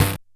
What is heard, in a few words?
Bent Snare; glitch; Bent Hits